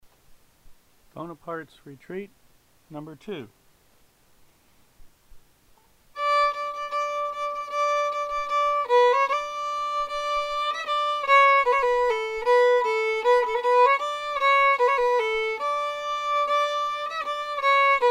fiddle; festival tunes

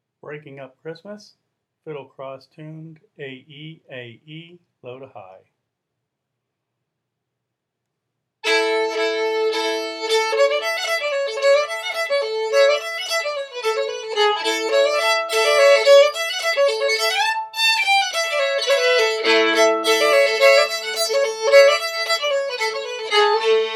fiddle; festival tunes

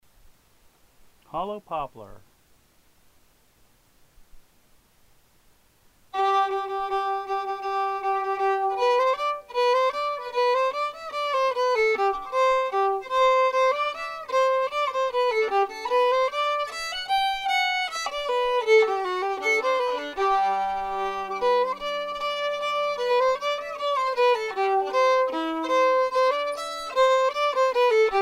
fiddle; festival tunes

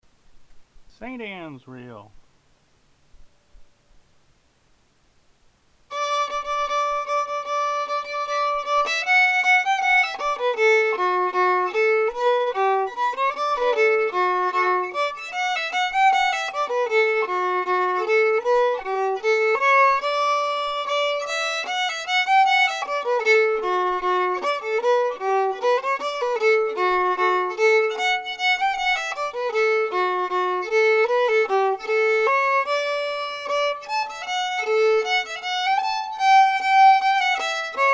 Reel, fiddle; festival tunes

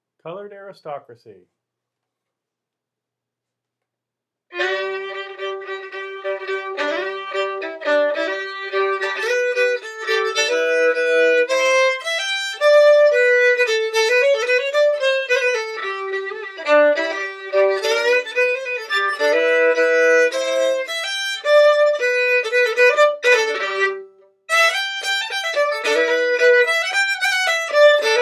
fiddle; festival tunes

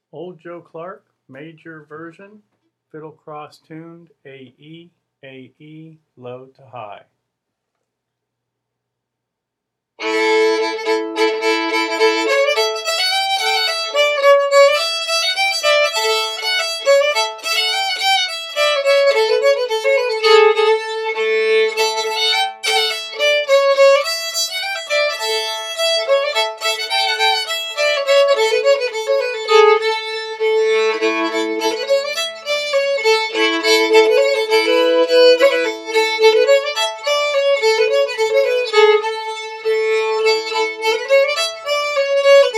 Major, fiddle; festival tunes

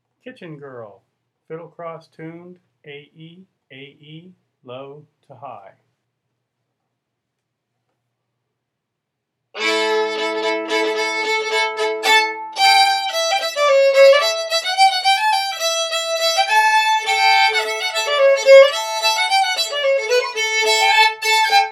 fiddle; festival tunes

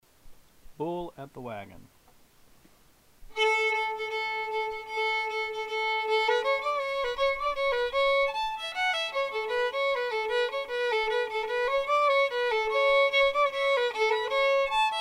fiddle; festival tunes

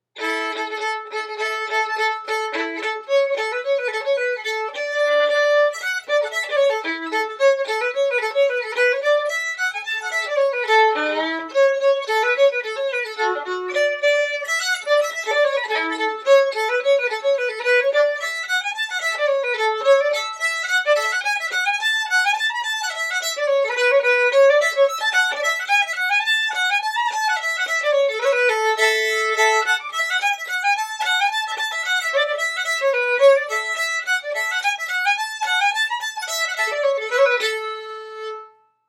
fiddle; festival tunes

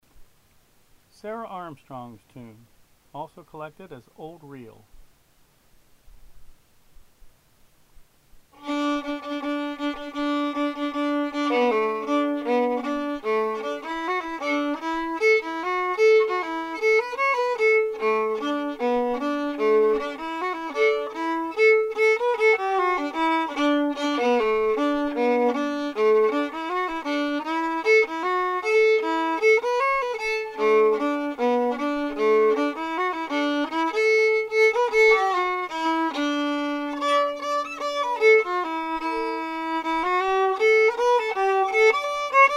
Old Reel, fiddle; festival tunes

